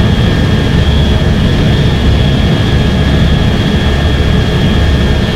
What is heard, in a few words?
engine cockpit; War Thunder; engines